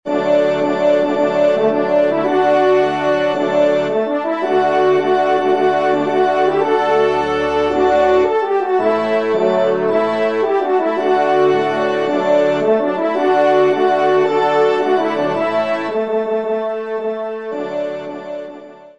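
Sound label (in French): trompe orgue, ensemble; Sortie; messe cantin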